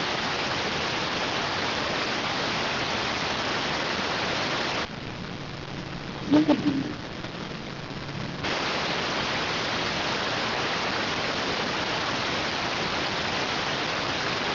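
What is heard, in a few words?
radioteletype receive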